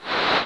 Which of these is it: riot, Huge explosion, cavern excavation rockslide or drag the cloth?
drag the cloth